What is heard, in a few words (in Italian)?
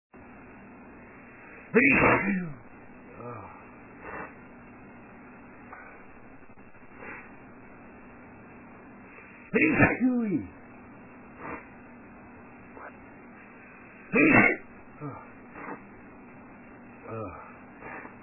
Sternuto, male